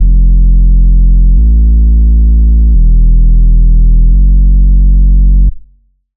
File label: Bass; Routed Sound; Spire Expansion - Flame Summoner Vol.1